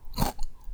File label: soft chew; dfcaverns; farming